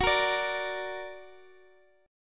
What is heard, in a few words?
F sharp Augmented F sharp Guitar Drop D; x,x,x,11,11,10